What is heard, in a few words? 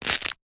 gore small bone break crunch